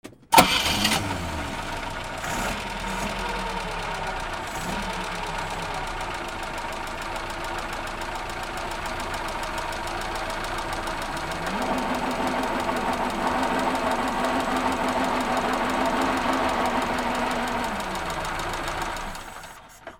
BOATS

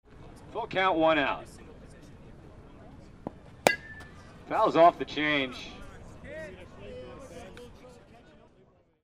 GAMES

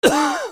PEOPLE

